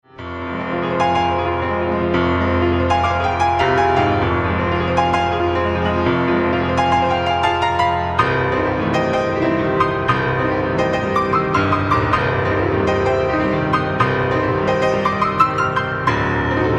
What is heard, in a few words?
Piano Thing